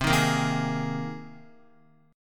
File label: C Minor 7th Sharp 5th, C, Guitar; strummed; JGuitar